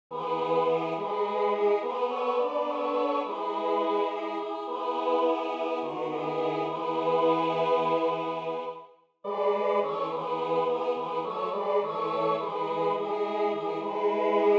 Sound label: tutti, Choral Music